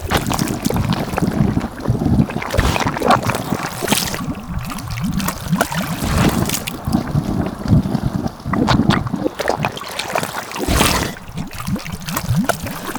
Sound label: Corrupted Summoning Pool Loop